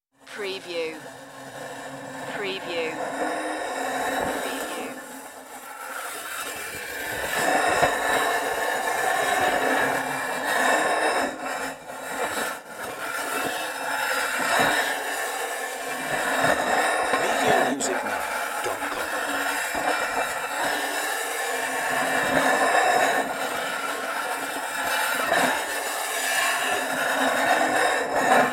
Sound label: sheet metal slide